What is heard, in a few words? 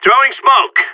smokegrenade